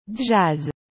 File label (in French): jazz